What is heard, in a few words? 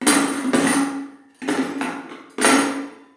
Diner Dish Walla; Levels Hub Diner 04 Target Mouth